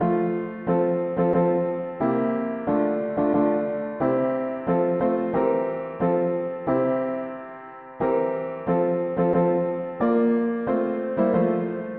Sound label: mono piano; Alas and did my Saviour bleed; hymns